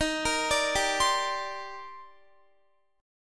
Eb Major 13th Eb down Guitar; arpeggiated; chord

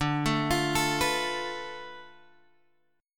D Minor 6th Guitar Open F; Dm6 arpeggiated; 14,16,17,16,14,x

